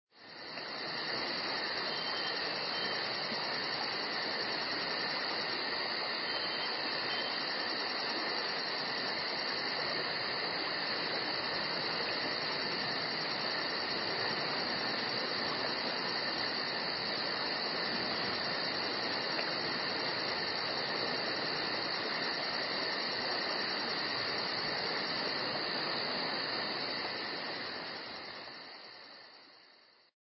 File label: Forest Evening